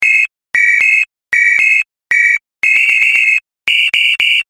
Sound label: Screech Call